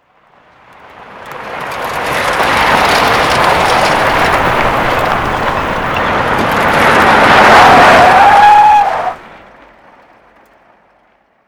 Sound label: jeepride opening dirt; Modern Warfare